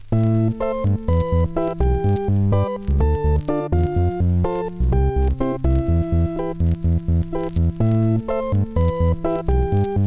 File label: Hammond; mod ringtone collection